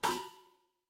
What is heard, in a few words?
cartoon bonk